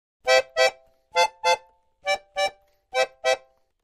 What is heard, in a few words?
Accordion Happy Rhyt; keyboards